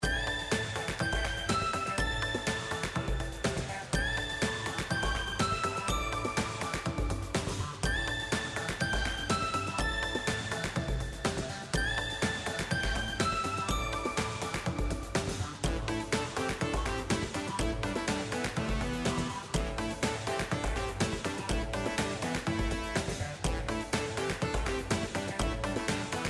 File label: BaseGameBGM, SLOTS; Crazy Rockets